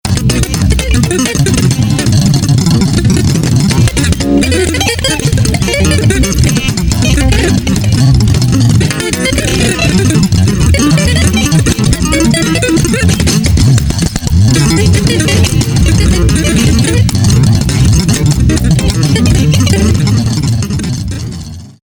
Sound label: Tapping Glossolalia; Avantronix